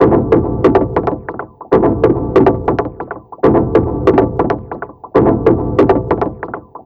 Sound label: NRG SFX, Trance